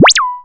UP ZAP; Back In Time Records; Big Bang; THE TECH

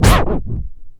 ST SCRTCH, SCRATCH

